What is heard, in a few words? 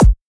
Dr Kick